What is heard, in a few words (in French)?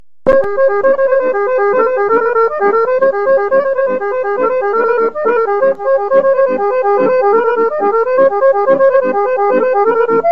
Kjustendilska, Bulgarie